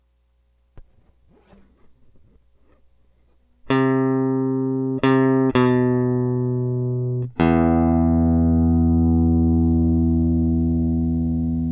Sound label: Elect Guitar